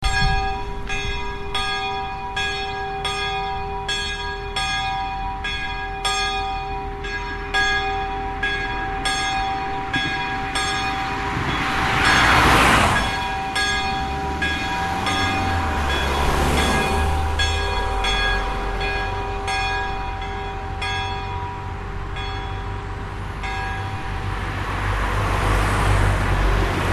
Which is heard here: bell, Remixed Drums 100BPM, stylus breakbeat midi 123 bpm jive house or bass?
bell